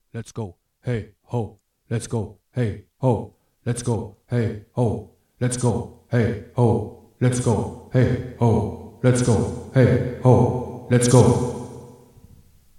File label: MXR Reverb